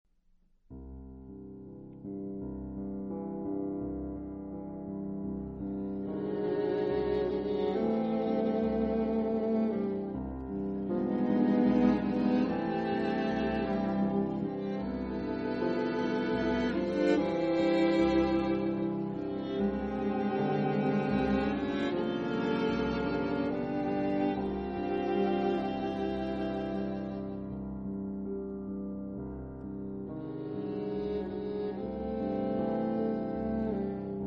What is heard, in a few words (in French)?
Quatuor piano